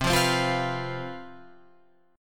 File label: C Major 7th Suspended 4th C down Guitar Open F; CM7sus4 strummed